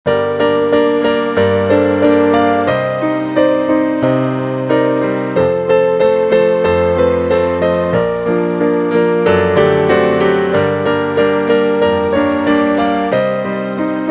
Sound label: piano